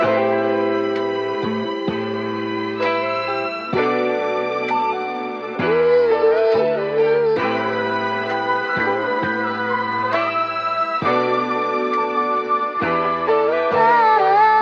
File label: strings voice guitar, Loops; Phonky